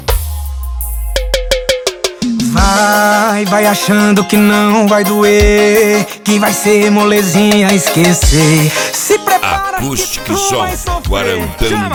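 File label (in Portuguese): mega funk mix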